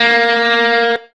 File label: electric guitar